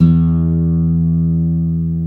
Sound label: GUI Ac. E2, GUIT AC; Best Service Gigapack 2